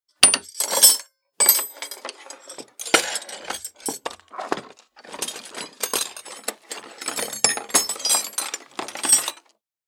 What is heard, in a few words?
Toolbox searching rattling and movement